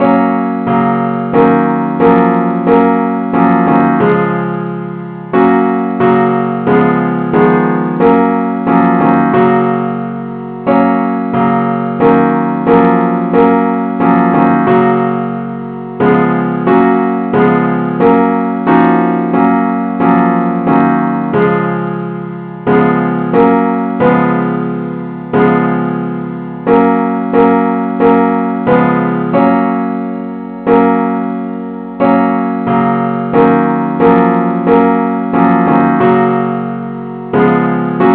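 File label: advance accompaniment, key f sharp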